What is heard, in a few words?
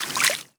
run water stop